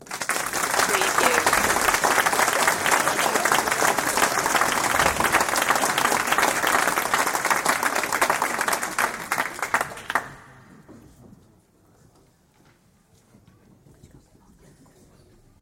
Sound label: Audience Applause; beanstalk audio